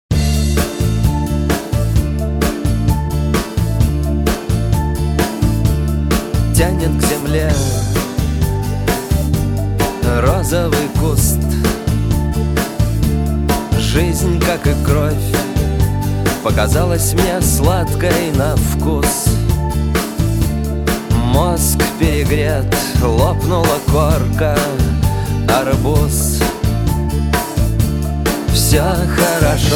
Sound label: song song song